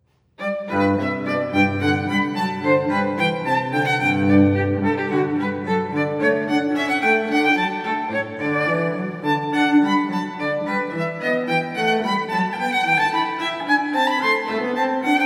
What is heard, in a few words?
Bouree from Water Music; Handel